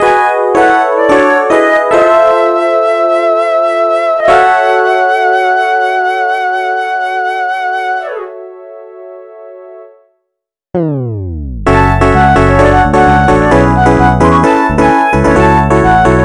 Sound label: funk remix no percussion; Overworld